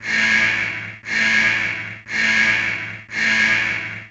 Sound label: warning